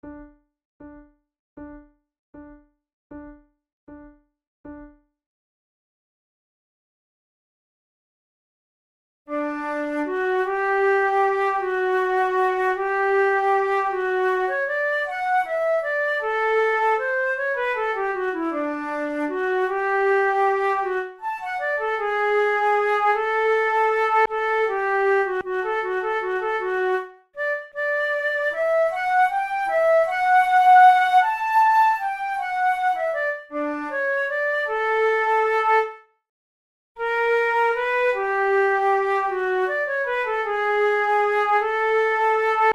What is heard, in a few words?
methode de flute; tulou